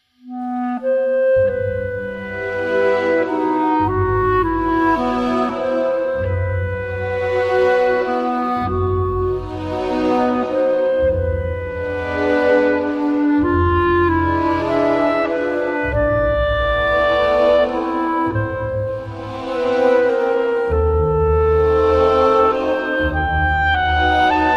Strings & Clarinet

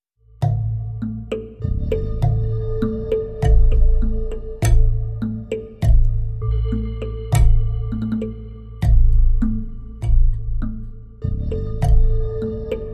African Wilderness, Percussion

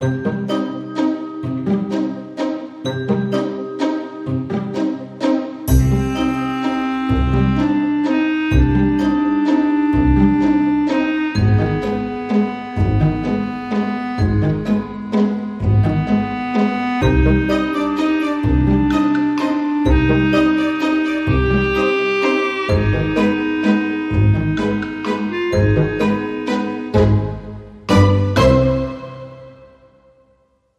Traditional Percussion

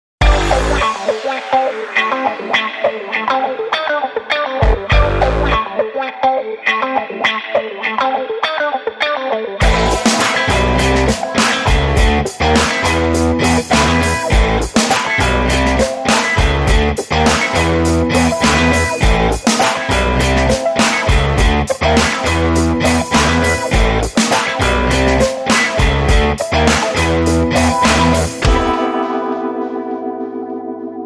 Bass, Rhythm

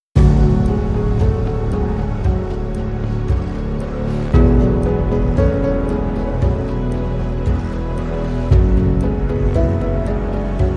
Counting Waves

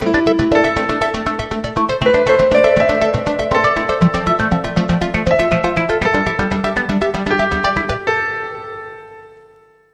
Hardharp

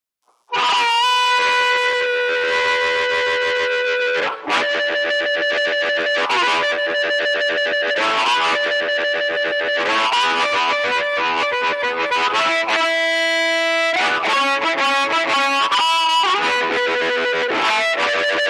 Gritty Club Blues Solo Harmonica

